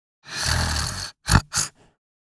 Little girl wakes up sudden snore

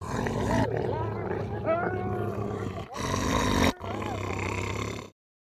Angry wolf snarling

